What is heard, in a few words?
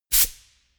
pneumatic pump quick burst